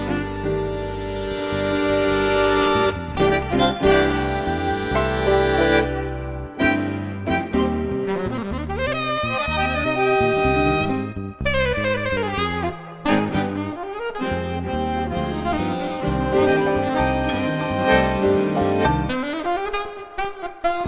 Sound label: Trio